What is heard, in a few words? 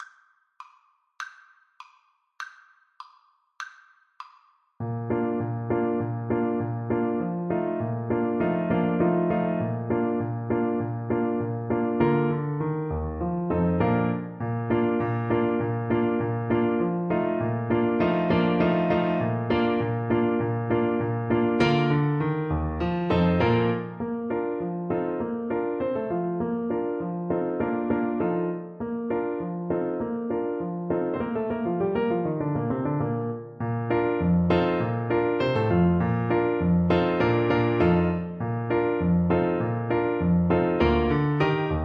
arkansas traveler, clarinet